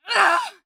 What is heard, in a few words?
Humans Female shout of pain; Screams Shouts 2; soundbits